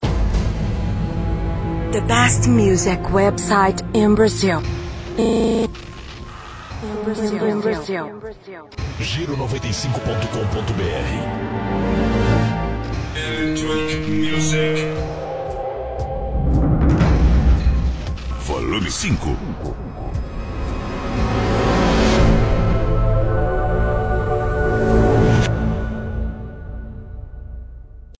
Electronic Music

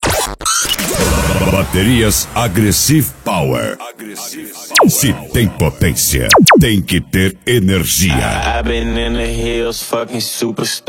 Baterias Agressive Power

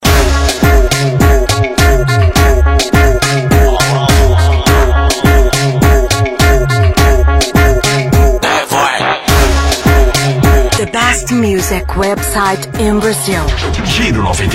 Reggaeton

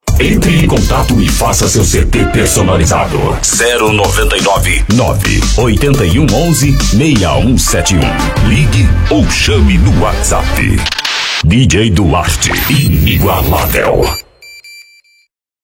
Deep Car

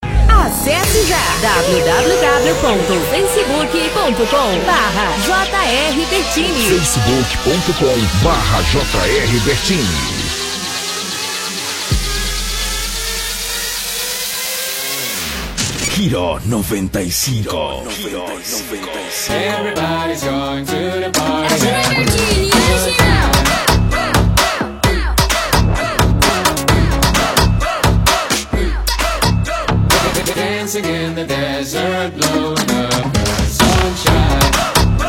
Funk Production Remix

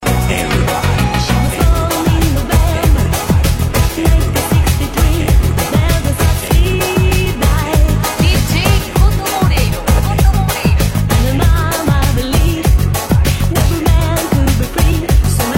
Dub Reggae Pop 90

